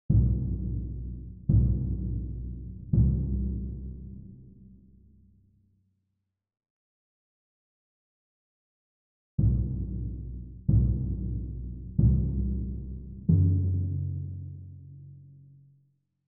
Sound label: timpani; Music (used and not used in the final version) for the movie Le détour